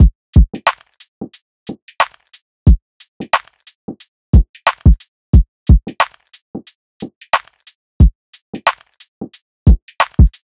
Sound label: Lofi Full Drum Loop - 90 BPM; Cymatics; Lofi Starter Pack